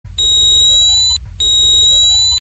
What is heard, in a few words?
ping